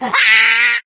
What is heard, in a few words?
rage at victim, infected female; Left 4 Dead 2; Source Engine